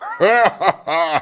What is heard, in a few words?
Laugh; Gong